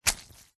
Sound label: foley wet cloth dab squelch soft single; zapsplat